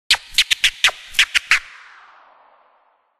Ring Vinyl Scratch; HTC Ozone

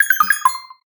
Twinkle, phonetones notifications; Pantech Flex P8010

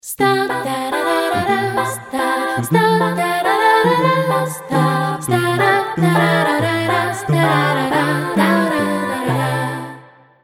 A Capella; Samsung SGH-T309; Ringtones

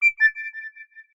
Flute

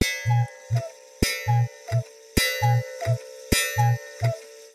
Cuckoo clock, alarms; Google Pixel 7

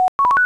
Name Dialler Start Tone, Nokia phone; Nokia N95

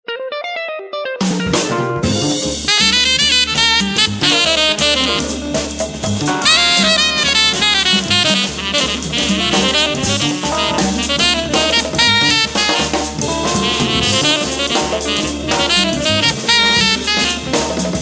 Jazz Bar; Samsung GT-B7510 Galaxy Pro

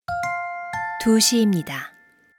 Hourly Alert; SKY; IM-U680L; System Sounds

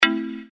buddy left, Sony Ericsson; Z555i

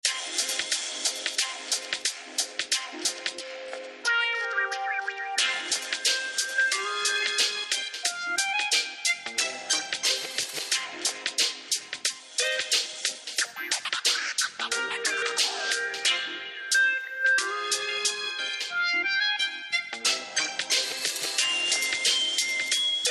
Fugue

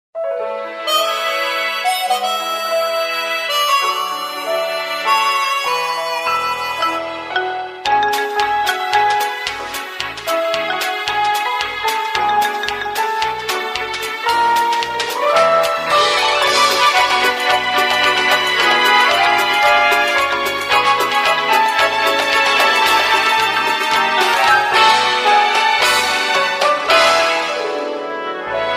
Ringtone, Samsung GT-C3510; Corby Pop Genova

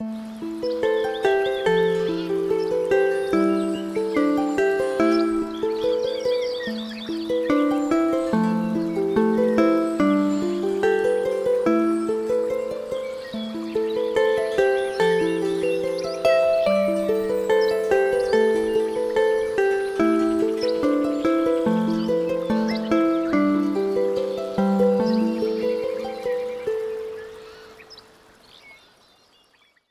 Ancient Rhyme; ZTE; Axon-20-5G; alarms

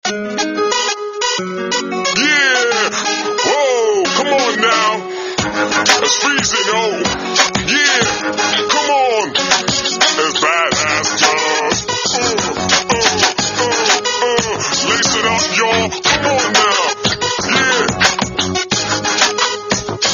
Hip Hop Guy; Samsung; SCH-U650 Sway

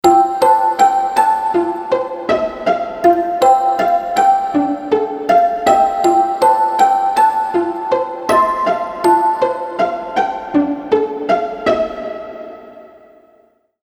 Lullaby; PlusFourSix

